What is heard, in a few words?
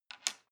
metal key small place